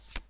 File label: deal, Card Deck; Nokia; 6260